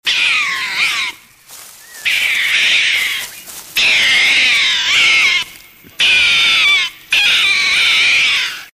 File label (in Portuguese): guaxinim efeito sonoro